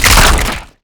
tree explosion; Explosions; modern warfare 2

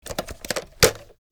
raise, reloads; black ops 6; iw9; iw9 india

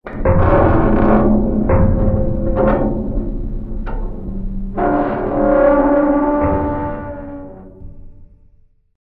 metal stress; amb elements; cod modern warfare 2

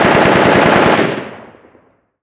gun ak47 med; modern warfare 2; amb elements

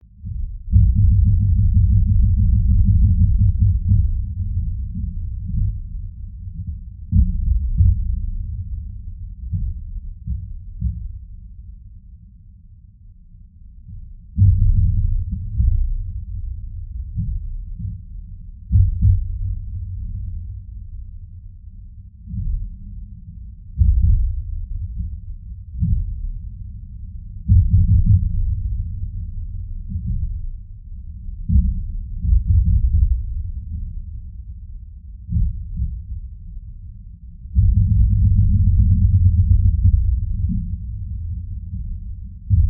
cave stress; cod modern warfare 2; amb emitters

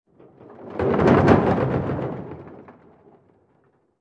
ac130 rattles; modern warfare 2; amb elements

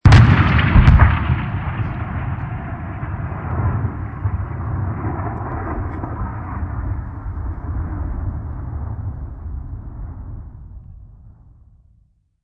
artillery med; amb elements; modern warfare 2

